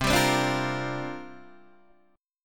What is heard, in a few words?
C 9th Suspended 4th C down Guitar Open D